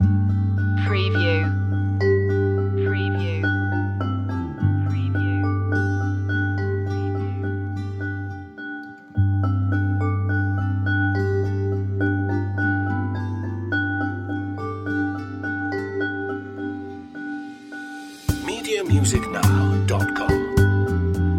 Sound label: safari lite loop